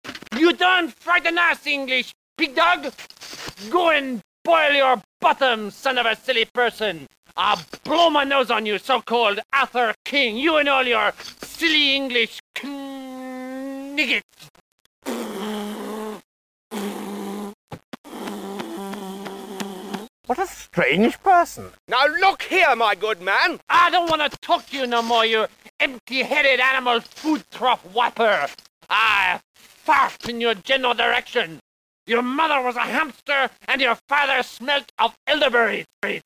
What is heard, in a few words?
fart; dirge